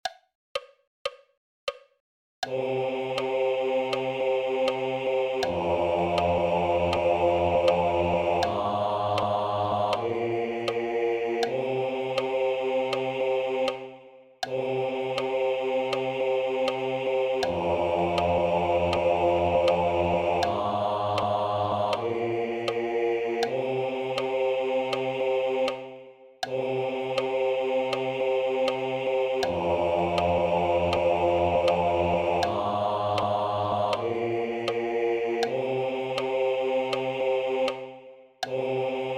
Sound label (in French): Basse